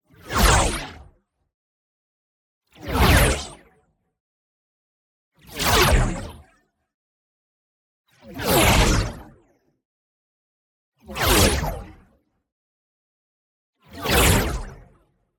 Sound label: WHOOSH SMALL Plasma Surge; boom library; Matter